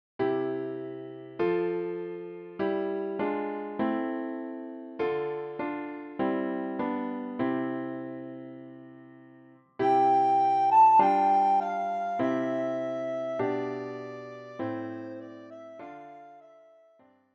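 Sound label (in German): Alle Jahre wieder, Sopranblockflöte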